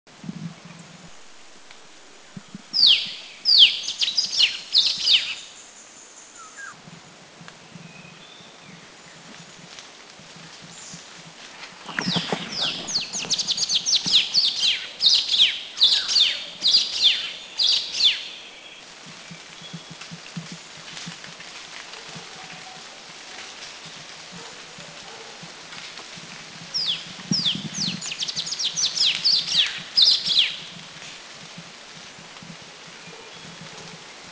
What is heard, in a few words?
Blackish Cuckooshrike, Philippines; Blackish Cuckoo-shrike Coracina coerulescens Country endemic; Subic; Seen on 2 dates at Subic, resp. 3 and 2 birds.